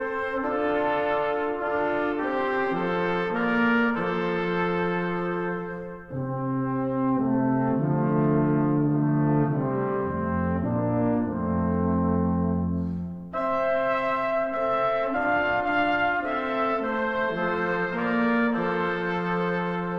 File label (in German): Joseph lieber, Joseph mein, Brass Quintet; Traditional